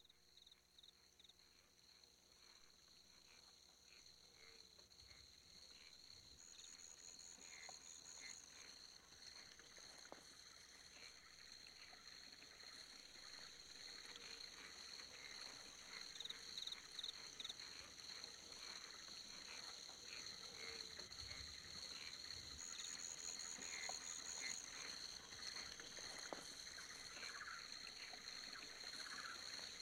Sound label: Amazon Rainforest; Nature Sounds - With Music